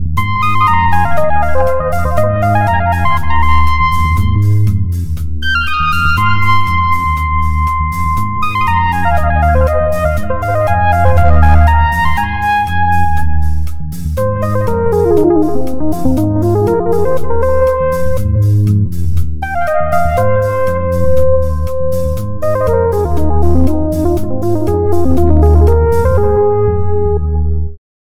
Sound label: LEAD Fractal Zone; SpheratorFM